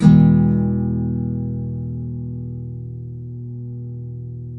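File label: nylon up major F, guitars, flam chords; Spectrasonics; Hans Zimmer Guitars VOL-2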